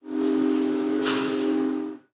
Train Passing Idle; subway surfers city sound effects; Android, iOS

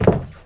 Step; Neighbours From Hell Console Edition; GC, Xbox

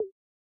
Laser Gun Fire Stinger; Going Under

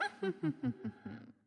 Teleport; Winkubus

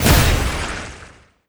Enemy Boss Common Active Hit

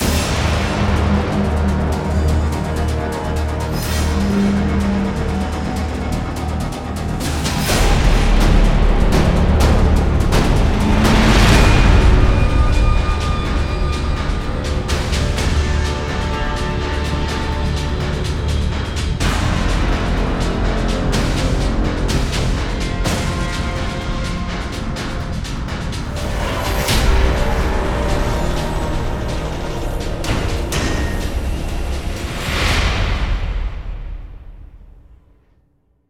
Base Loop low

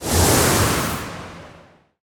Airdrop Explosion; gamerip; disney star smash; android

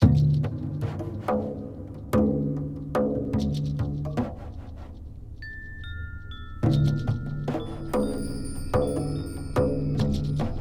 Amb Outdoor; The Hobbit; PS2

